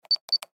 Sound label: Keypad Door Lock, Keypad Door Lock Press Buttons Beep; Office; Blastwave